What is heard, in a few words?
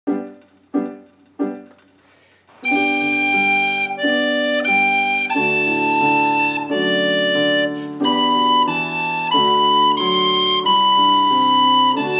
Adeste piano